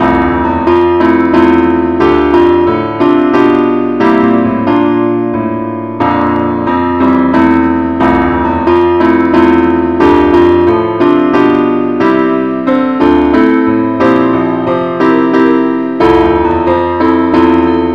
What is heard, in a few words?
keyboard only key f